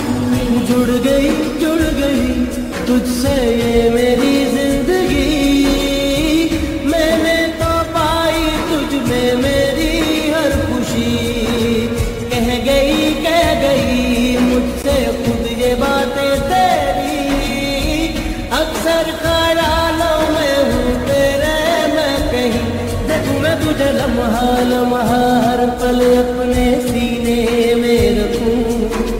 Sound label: Sad Song Ringtone